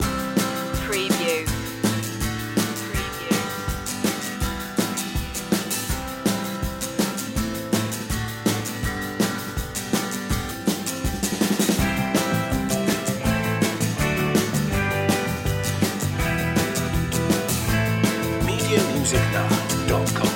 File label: upbeatful loop